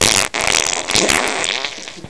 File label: fart